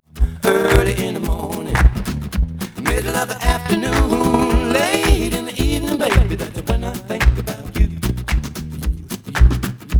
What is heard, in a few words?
noised; 3bit